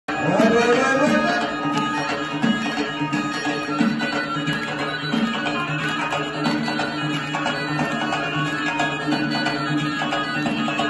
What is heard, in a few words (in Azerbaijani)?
avar mahnisi lezginka